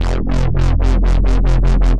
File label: aggressive dubstep wobble bass growl f